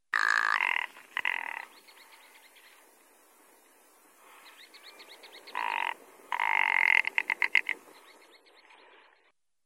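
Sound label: tundryanaya kuropatka voice, rock ptarmigan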